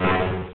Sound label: PICKUP; ReaderGames; TankedUp